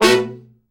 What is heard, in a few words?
Killer Horns, SECTION 7TH; Best Service